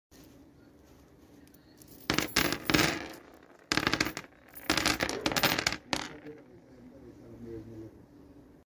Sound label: bolt and nut; پیچ و مهره